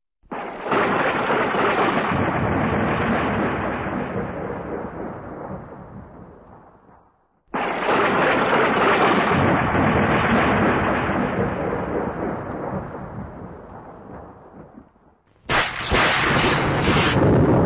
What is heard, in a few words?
Thunder Storm